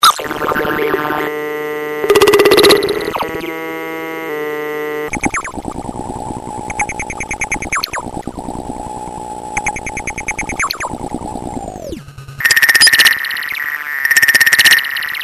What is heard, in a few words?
throbber